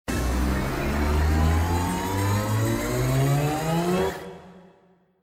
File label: Wild Anticipation; slots; Wealth Gods2